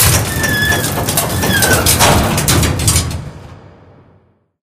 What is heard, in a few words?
sugarcane lift gate close; Left 4 Dead 2; Source Engine